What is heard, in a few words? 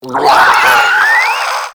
Infested Crawler Death; Warframe